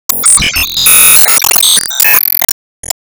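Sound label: Card Level Up Excellent; Card Level Up Great; Card Level Up Success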